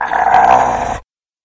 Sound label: monster swamp muck